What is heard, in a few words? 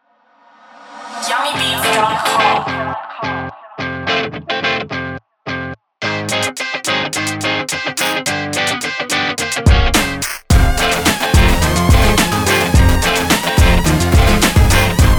beat